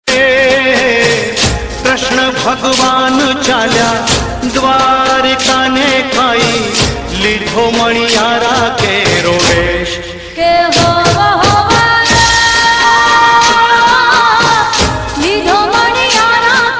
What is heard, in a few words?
navratr dandiya, gujarati ringtone